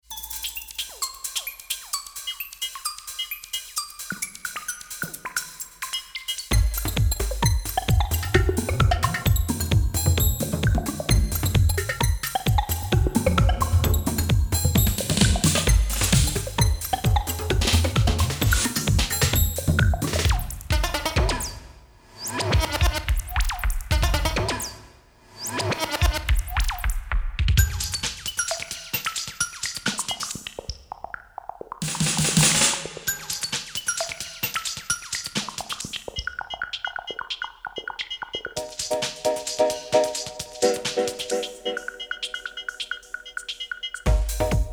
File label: Electric Archaic Loops